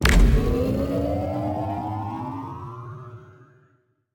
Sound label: Flag Planted; CTF